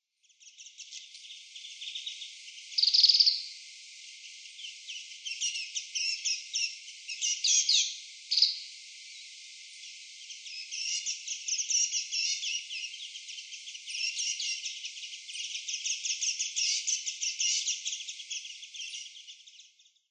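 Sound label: Japanese white-eye, utsunomiya; mejiro; koe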